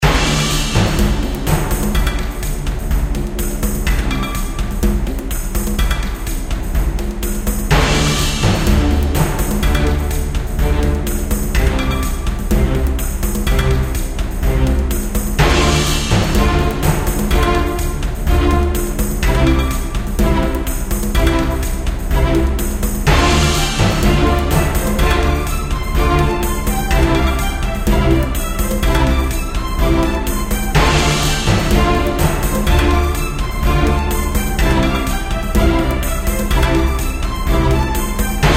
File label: More Perc; Militant; Colony